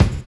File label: BD, Drums Dance & Groove; USB Soundscan vol.11; AKAI; NYORK KIT